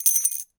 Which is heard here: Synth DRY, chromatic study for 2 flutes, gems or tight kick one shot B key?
gems